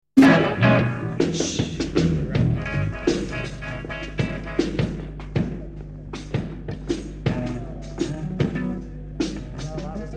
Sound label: Rhythm Instrumental